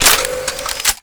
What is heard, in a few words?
Gun Weapon Pickup; SFX Kit; Pickups